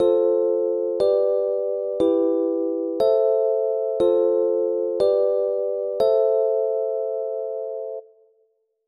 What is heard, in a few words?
Anime Progression